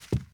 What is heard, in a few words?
carpet, Footsteps; civ13; civ14